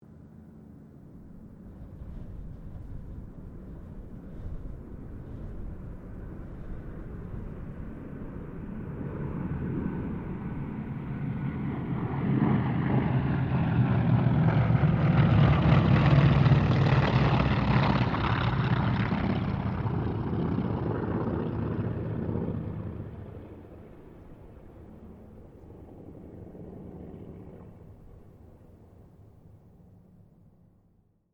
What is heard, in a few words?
AIRCRAFT TROJAN SQUADRON 4 PASS; Mixed aircraft squadrons; Airplanes; Sound Effects:Vehicles